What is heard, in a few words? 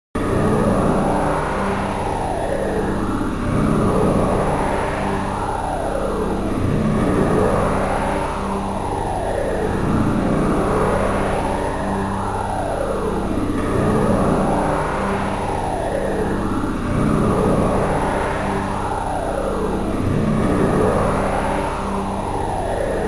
Ambience (Long); Metroid Prime Pinball